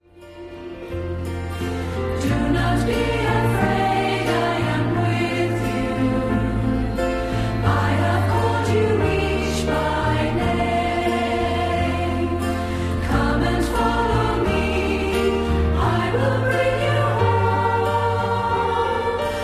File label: contemporary choir